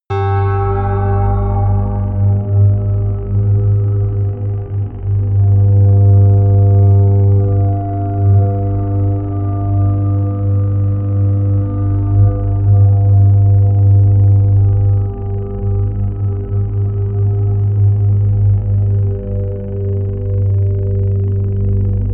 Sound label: pluck'n'creak; flaechen